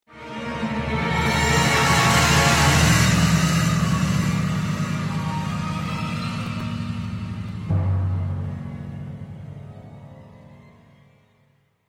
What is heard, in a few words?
Crescendo Stab; Star Trek Deep Space Nine The Fallen